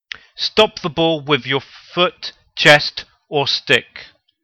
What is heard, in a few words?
stop chest stick